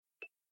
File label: camera countdown timer; Sharp AQUOS sense8 SH-M26; sharp audio